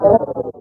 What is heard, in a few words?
Astral Enemy Death